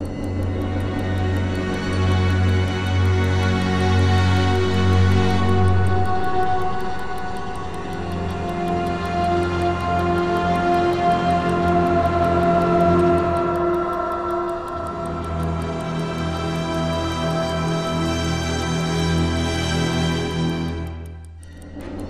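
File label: strings loop; transformers war for cybertron gamerip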